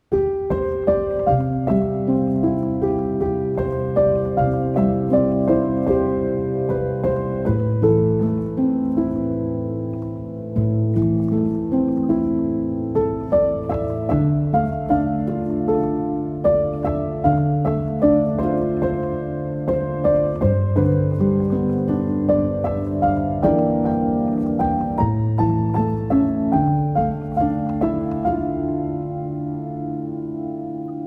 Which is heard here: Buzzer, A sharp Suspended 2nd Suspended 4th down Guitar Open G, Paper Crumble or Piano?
Piano